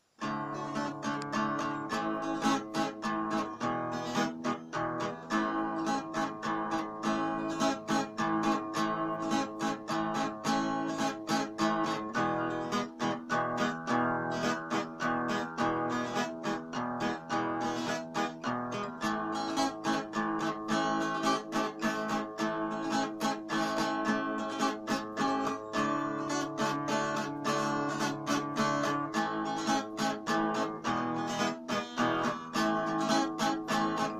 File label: Instrumental